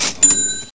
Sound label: cash